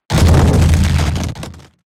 Broken wooden objects

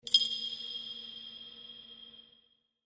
UI comform sound

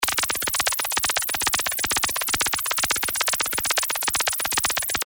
beep

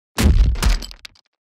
SMACK

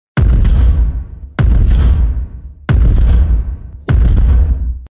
Robotic footsteps

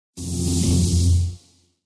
Whoosh

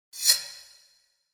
UI back sound

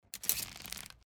pulling arrow

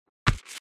landed on the ground

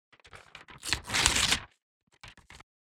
paper tear off

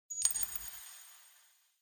UI comform sound

